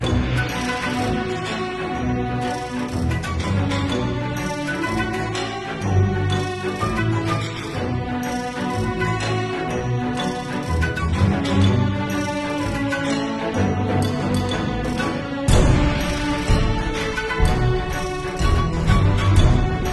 Bgm Mining; fantasy forest story

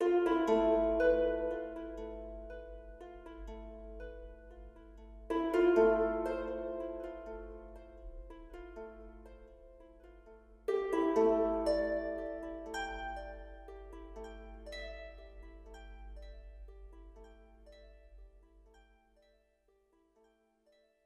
Market Front

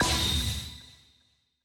Special Move Gauge Max

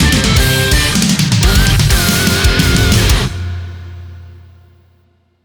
level complete; Iron Meat; PS4 PS5 Switch Windows Xbox One Xbox Series XS gamerip 2024

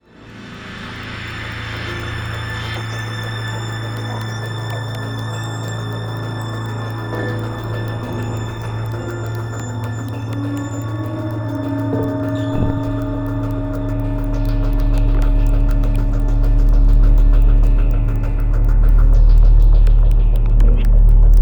amb; Terrorist Takedown 3

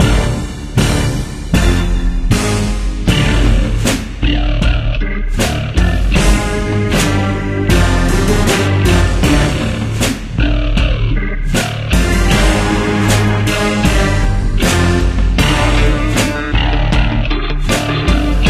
Menu Music Loop

